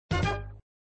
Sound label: Sound Fanfare End of Ball, flipper; Fanfare End of Ball v01